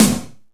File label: FATS DRM, FM DRUMS; East West; Global Sounds